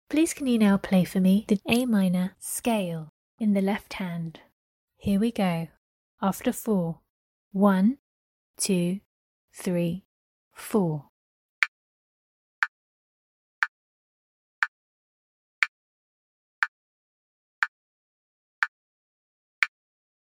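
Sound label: A minor scale left hand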